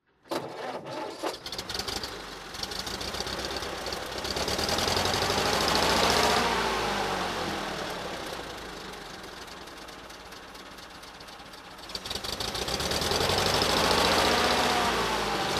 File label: zedel c16 throttle